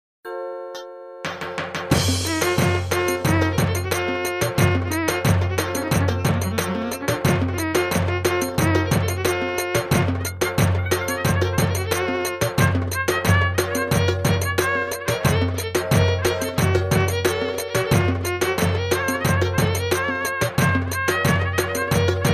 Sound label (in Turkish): gw8 fl ritim antep ciftintro